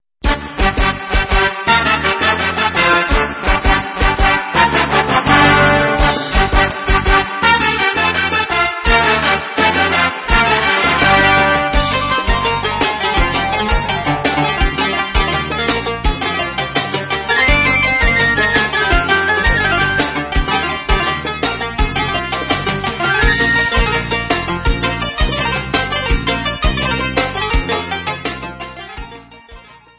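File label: Instrumental, Israeli Dances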